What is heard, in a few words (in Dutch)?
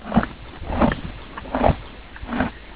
kauwen